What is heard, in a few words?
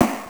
Snare, Genesis; ToeJam & Earl 2